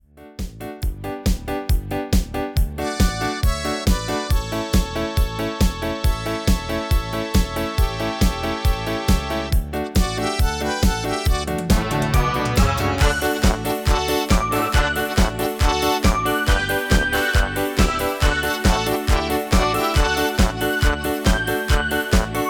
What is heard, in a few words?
MIDI